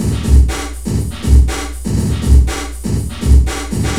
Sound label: TRIPHO, Drums